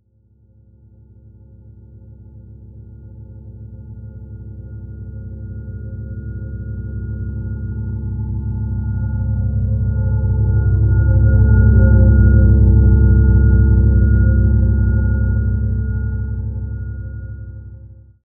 TANKER; AKAI Anime Sound FX; FLYOBJECTS

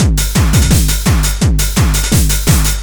LOOP, Eurotech; Sounds Good

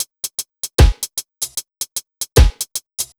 BT, Ragga & Dub Reggae; USB Soundscan vol.27; AKAI

